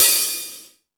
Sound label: Lazer Drums, E-MU Pro-Cussion; drum machine